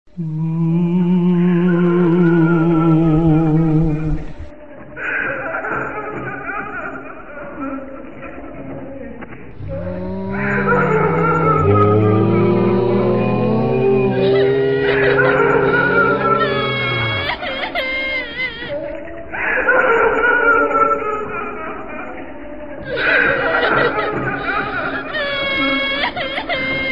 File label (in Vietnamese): Tieng ma hu